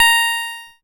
A#, PIANO; Big Fish Audio; Synclav FM

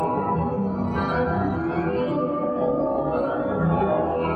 Tonal Re Draw, sparse soundscape

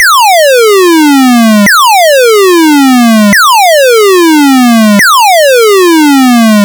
Game Boy FX Alarm Loop, chiptune; Nintendo Game Boy

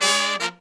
Pop & Funk Brass

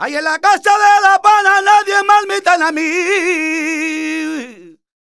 voc d#, Flamenco Vocals Male Cantaor; Best Service ProSamples vol.46

